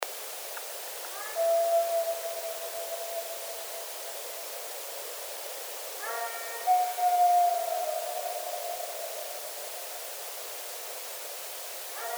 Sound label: Colorado Howl, bigfoot